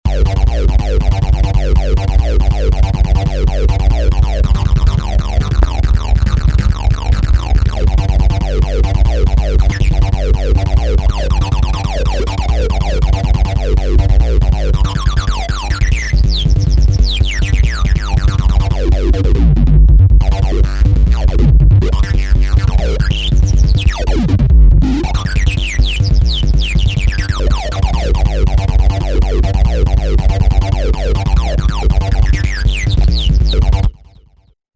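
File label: TB Square Distorted Bass, Roland V-Synth; Factory Patches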